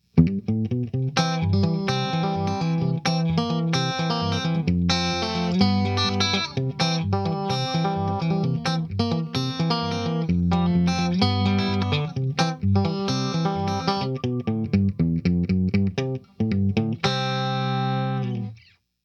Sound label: EMMA Transmorgrifier Compressor ON